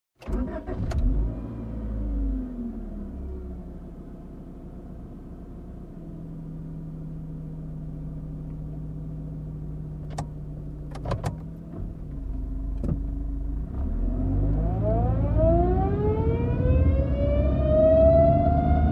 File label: Car cockpit reversing